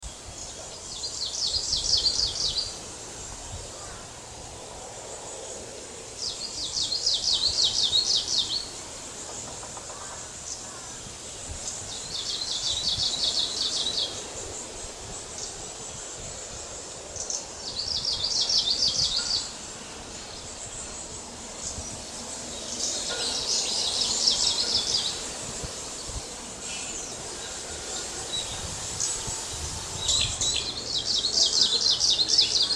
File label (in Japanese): oomushikui